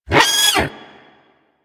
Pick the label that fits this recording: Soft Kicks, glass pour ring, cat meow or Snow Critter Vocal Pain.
Snow Critter Vocal Pain